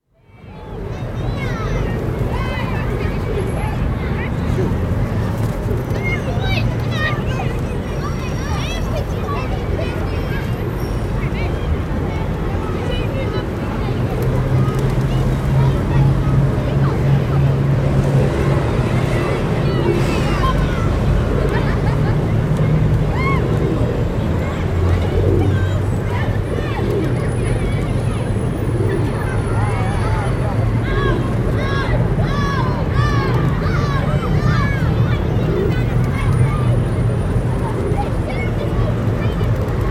Trafalgar Square, London, mid distant group of children feeding pigeons and seagulls, heavy traffic background; City; BBC